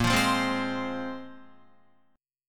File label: Bb 7th Suspended 2nd, Guitar, Bb7sus2 chord; 4F,4A,5C,5G,5C,6E; Bb7sus2 strummed; 5,8,5,5,8,8; JGuitar